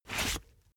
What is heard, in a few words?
boxing gloves slip off fast; sport freizeit; boxen